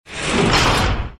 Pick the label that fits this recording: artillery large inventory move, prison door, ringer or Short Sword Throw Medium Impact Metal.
prison door